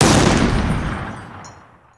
axis anti air; WAR

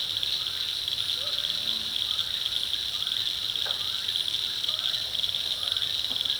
crickets night; WAR

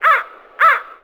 crow

